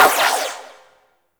Shot 2D Fire, Weapons Hand Shotgun; Prime Pyrana

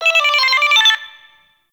Friendly Hack Jingle; Warframe; Entrati; Necramech Hack

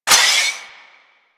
Lantern Power Up Short; Warframe; Emissary Season Two

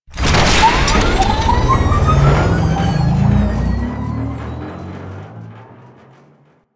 Skiff Engine Boost Slow, Vehicles; Warframe; Grineer Skiff Player Vehicle

